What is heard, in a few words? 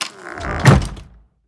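wood single light close, door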